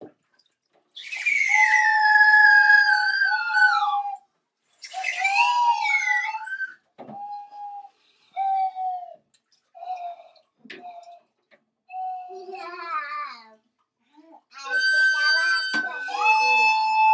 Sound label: flutes symphony